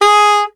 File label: Soprano Sax Portato G#3; Orchestral; DirectWave; Varazdin Orchestral